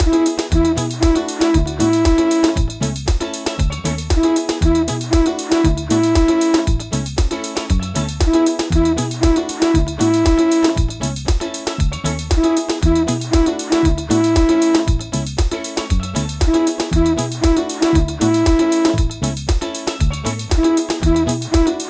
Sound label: Conch Songs Soca Mix